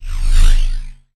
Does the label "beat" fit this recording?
No